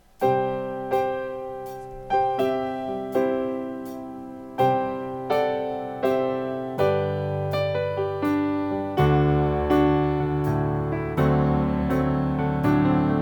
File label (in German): Mehrstimmig